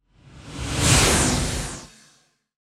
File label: swoosh delay